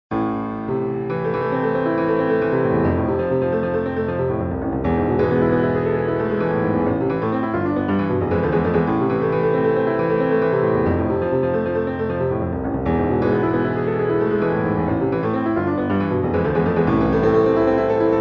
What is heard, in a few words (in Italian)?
Studio op.55 n.1 Canzone sugli arpeggi II